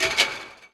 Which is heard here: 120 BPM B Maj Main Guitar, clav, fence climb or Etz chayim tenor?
fence climb